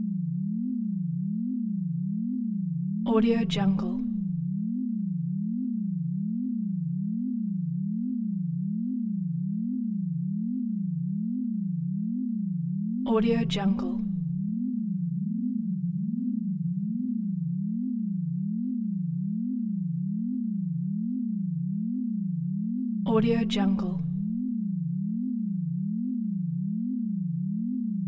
woo woo sci fi tone dual tones; prosoundfx

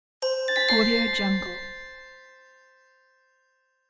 save game

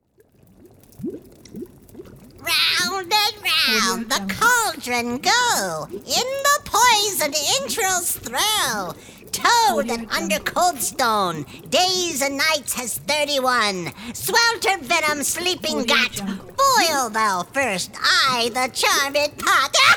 witch's spell with bubbly cauldron

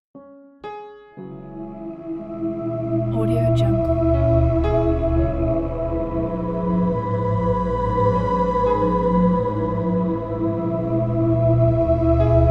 creepy ambient

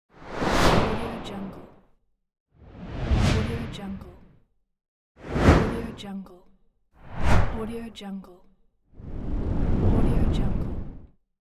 whooshs

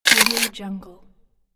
biting an apple

